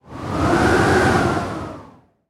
Wind Howl; Horror Effects

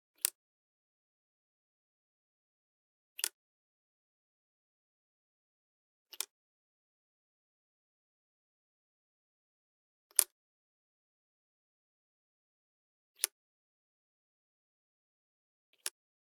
Load Revolver; Hollywood Edge; Heavily Armed

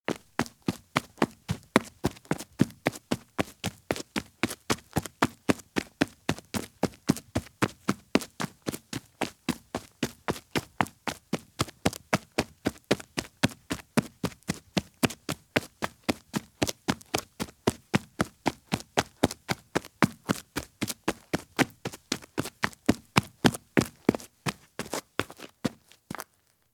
Women's High Heel Shoes Run on Dirt; Sound Ideas; Footstep Sound Effects

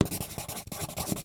Write on Paper with Pencil; Office Supplies; 1001 Sound Effects

